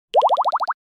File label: Multiple pop